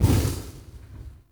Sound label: Invis Rune Smoke Melee Sweep; Powers